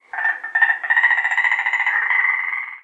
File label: frog long; ambient, random animals, frog groups; Left 4 Dead 2